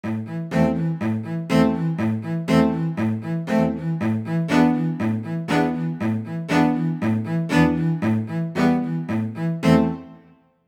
cello close; eastwest qlso

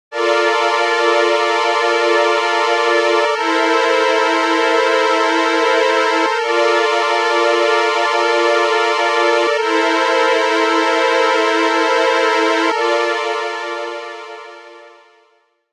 arp quartet violin ensemble; gforce vsm

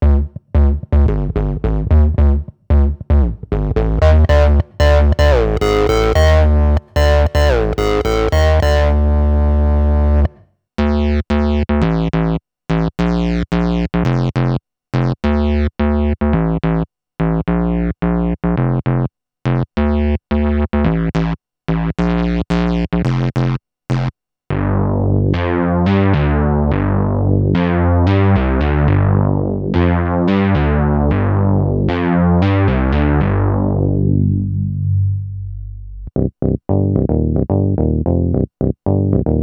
bass, waldorf blofeld

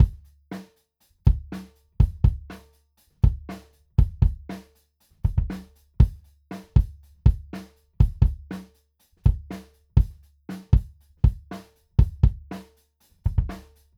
kick gms front; crowley and tripp el diablo; drums

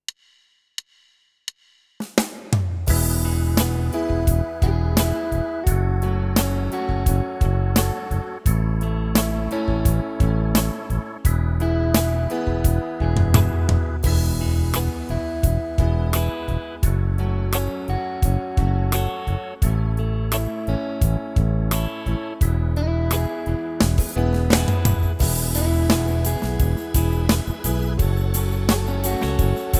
style pop and rock live 8 beat, yamaha tyros3

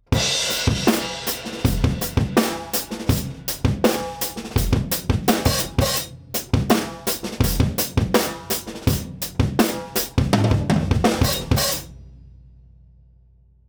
drums; avec compresseur / EQ; neve; AMS-Neve; batterie

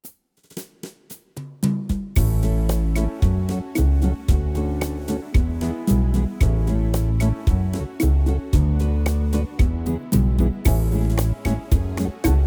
style latin guitar rumba, yamaha tyros3

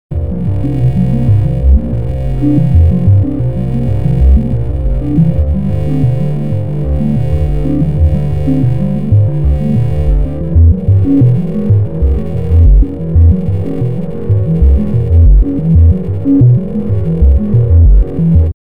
move pad; fabfilter volcano2; jsmovepad

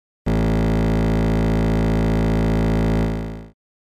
cello

